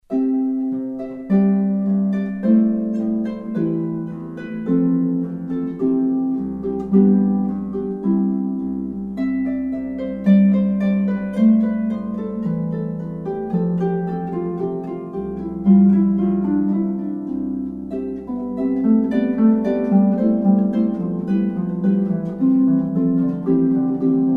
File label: solo harp; Chaconne; Clarke